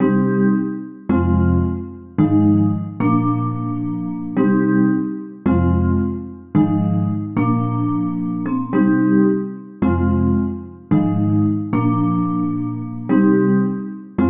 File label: Pluck Edgy Vibe Cm 110; Sample Banks; Melody; Keyboards